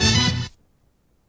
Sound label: orch hit